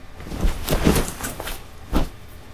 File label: clothes; people; Pirates Of The Caribbean